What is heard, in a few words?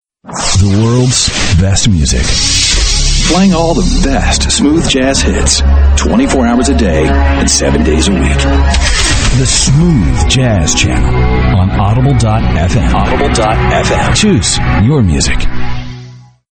Smooth Jazz; Audible FM; ADULT CONTEMPORARY; RADIO IMAGING